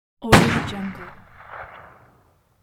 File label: gunshot echoing